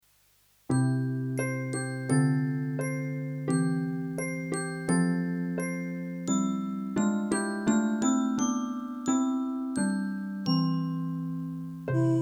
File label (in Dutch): slaap maar lekker karaoke